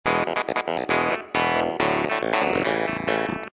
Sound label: Ska Clav; Loopology; Ska Till Ya Drah; Lounge & Reggae